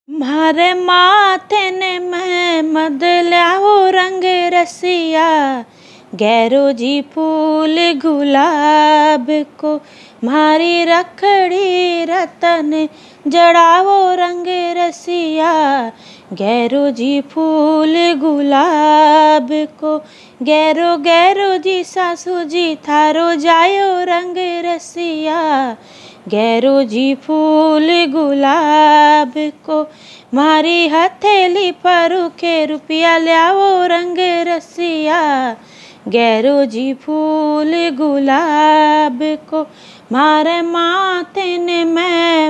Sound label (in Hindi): म्हार माथा न मैंमद, रात का गीत; गनगोर गीत